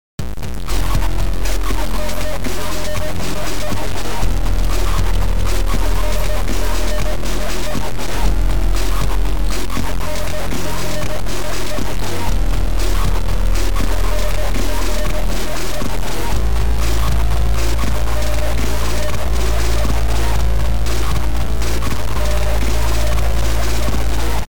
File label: Conga Fever; 112dB Morgana